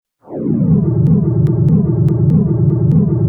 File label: lift begin, movers